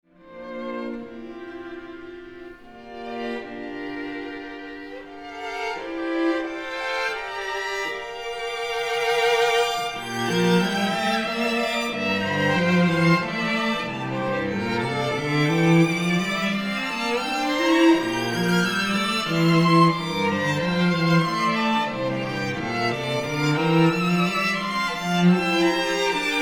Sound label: Gentle Waltz; Southern Harmony; chamber works